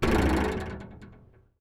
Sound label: metal plate